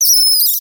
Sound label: animals insect chirp vocalization scared; sound effects one